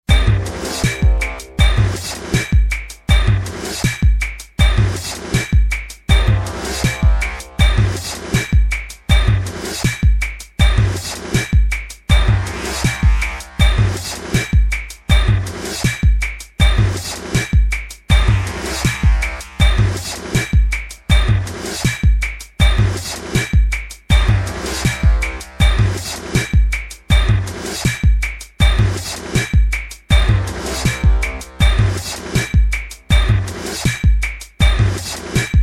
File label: Industrial Loop